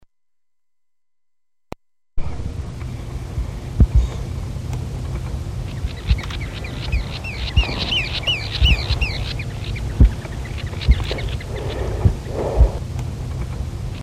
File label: diademed sandpiper plover